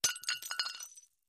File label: Glass Breaks Light Ce, clay & ceramic